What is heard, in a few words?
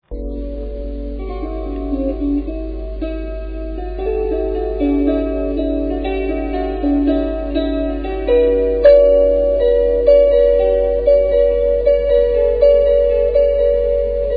10 string